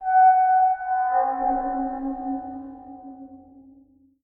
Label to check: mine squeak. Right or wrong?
Right